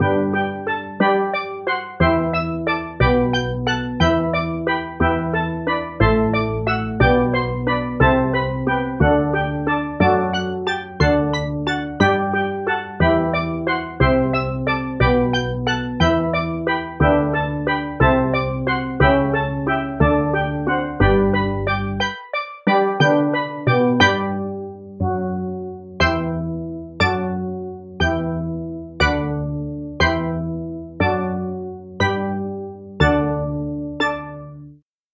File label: steel drums